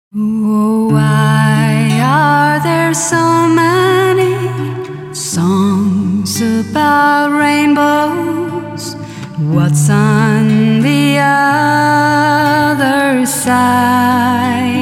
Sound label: singing